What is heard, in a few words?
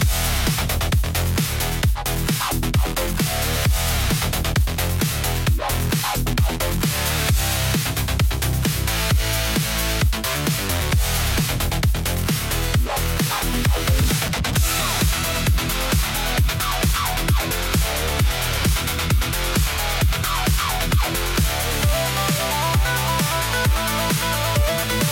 loop